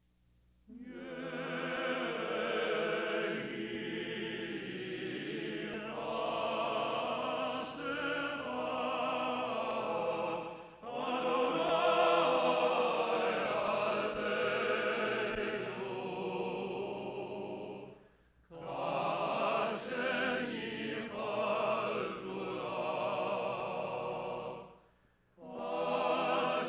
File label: Yehi Hasdekha Choir; Shabbat Morn